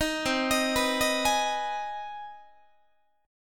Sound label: C Minor Major 7th Sharp 5th chord, Guitar; CmM7#5 arpeggiated; JGuitar